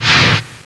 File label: attack; zombie giant